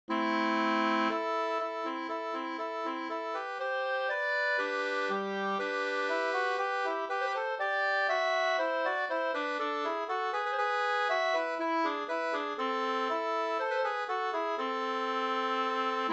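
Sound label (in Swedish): brudmarsch Dalmarsch Uppland 2 stämmor; Marstalla-Olle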